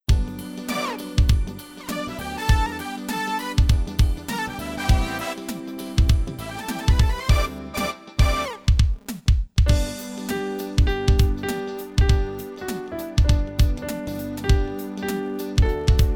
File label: Instrumental